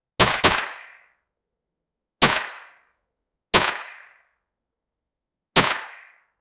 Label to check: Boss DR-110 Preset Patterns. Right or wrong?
Wrong